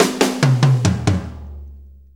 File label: 6-8 Fill, Drum Tools; Sonic Foundry (Sony Creative Software); Loops & Samples Drum Tools; Fills